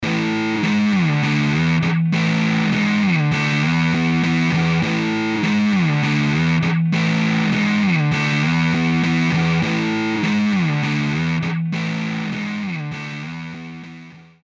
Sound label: Edgy Rock Guitar; Apple GarageBand 2; Apple Loops